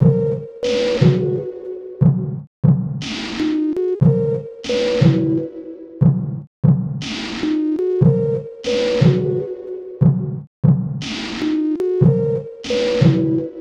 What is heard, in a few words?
Sinewave